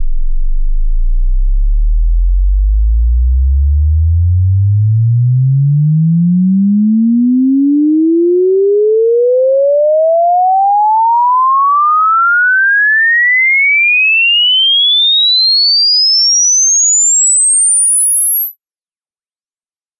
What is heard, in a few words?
frequency sweep 20hz 20khz; speaker test